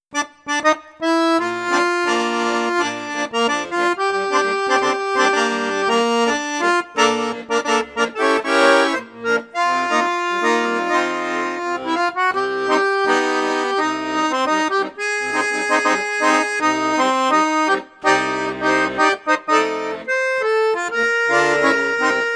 registre basson flute juste flute haute, accordéon; crucianelli super king

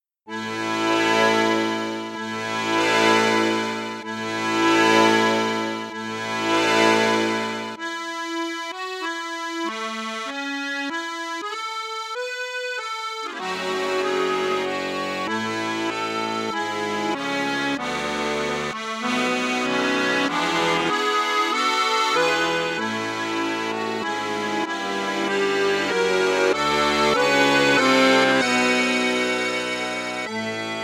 registre flute basse flute juste flute haute, accordéon; cavagnolo professionnel 10

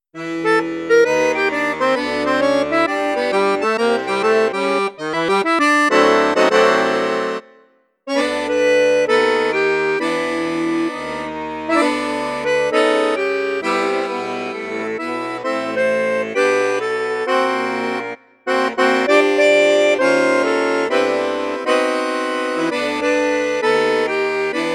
registre basson flute juste piccolo, hohner vivaldi accordeon

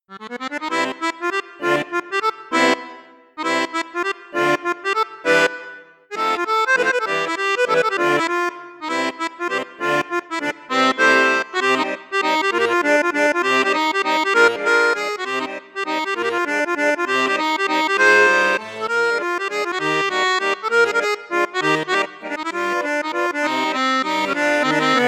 registre flute juste, accordeon; crucianelli 25

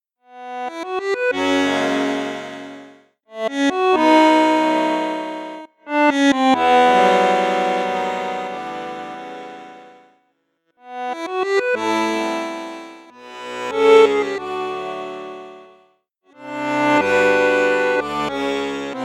registre basson, Accordéon chromatique, 4 voix, 120 basses; saltarelle

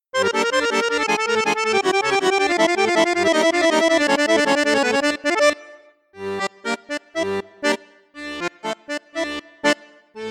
registre basson flute juste, accordeon; cavagnolo vedette 10

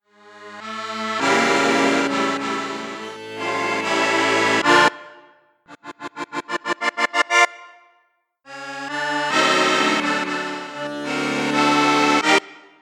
registre basson flute basse flute juste flute haute, accordeon; coope armoniche diamante 805

